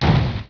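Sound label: hulk walk; Robotron; PC Gamer 39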